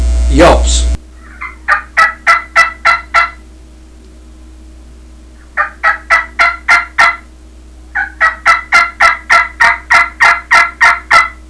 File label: ultra belle yelps, turkey; dixie